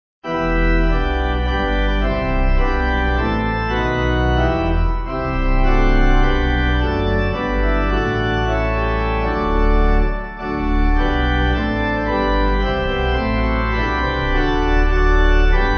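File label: Pipe, hymn backing; Breslau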